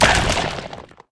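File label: ant damage, monster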